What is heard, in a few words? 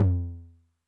Med Tune Long Decay, 909 LOW TOM; classic machines; LT - LOW TOM